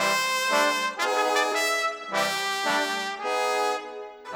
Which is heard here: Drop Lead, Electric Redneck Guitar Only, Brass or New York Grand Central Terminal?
Brass